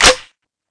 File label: m203 close, weapons